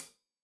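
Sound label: hat closed, hi-hat cymbals stereo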